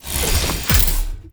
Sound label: combine switch box close stop; mechanical units